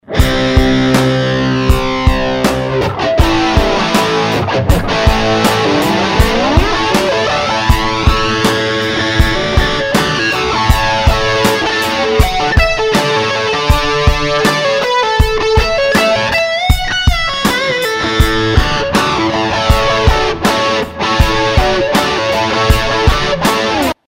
tonelab vox

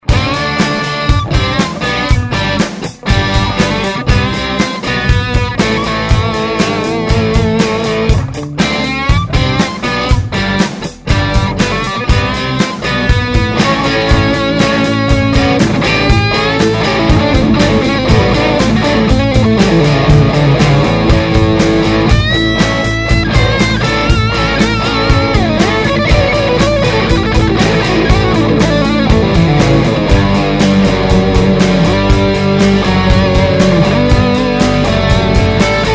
overdrive

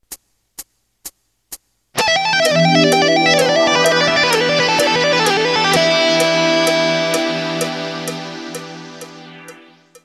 e wide range, scales

